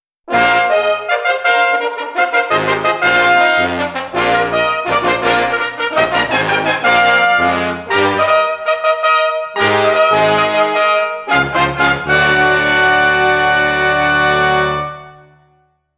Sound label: Ceremony Fanfare; Wing Ceremony